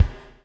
BUBBLE; Spectrasonics - Backbeat

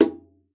CON TONE; Xsample VOL-9

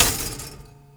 Foley Toaster Snare; essential drumkit

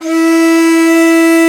DIZI, Old World Instruments, Winds

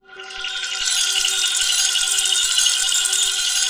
PAD, Drum & Bass; Ueberschall; Drum & Bass Resonance

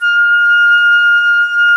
Flutes, Roland; Club-50 - Foundations Roland

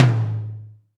DM5 Tom M, Alesis DM5 drum machine

